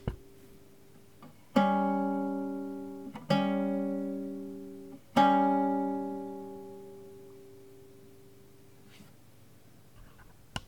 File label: intervals d5, guitar